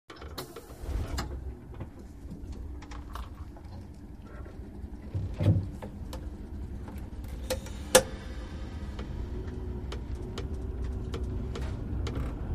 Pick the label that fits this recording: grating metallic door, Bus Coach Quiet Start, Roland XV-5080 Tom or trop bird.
Bus Coach Quiet Start